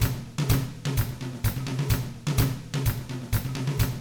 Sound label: 127 Drums